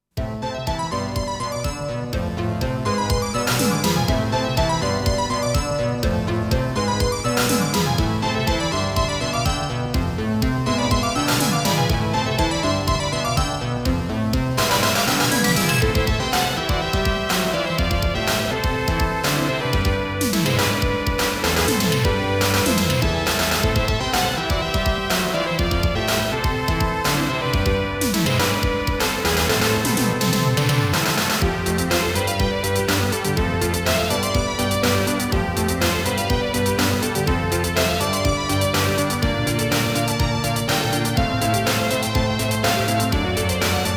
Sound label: Ranking BGM